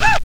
VOCALS; Ueberschall; Jam Box

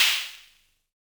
Snare, Univox SR-95

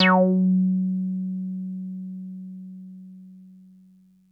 TB F#5; Music Store Sound Service; Einstein

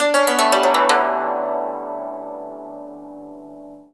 YANG CHING, Heart of Asia; Spectrasonics

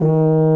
D#3 TUBA

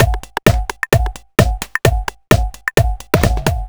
Drum Loops 130bpm; Zero-G; Soundclash Nu Dance Electro; Acidized Wavs; Snap Crackle

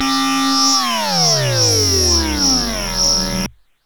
Monotron Sci-Fi

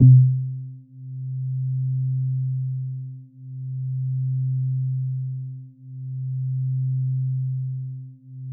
Synth Basses C3; Music Store Sound Service; Einstein

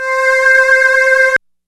LAZER PAD, OBERHEIM; Rubber Chicken Software Sample Bank

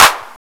CLAP TRAP; FAIRLIGHT CMI IIX; PERCUSN1

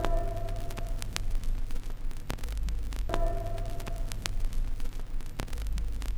Crackle Loop, Vinyl Noise; noise hiss crackle

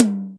DRY, Acoustic Tom; Yamaha DTXpress

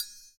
CYM, Ethno Techno; ILIO - Ethno Techno

